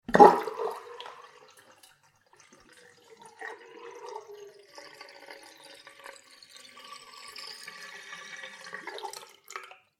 household sink water empty, Plug Pulled On Full Small Sink; Water Drains With Slight Gargle From Plughole; Kitchen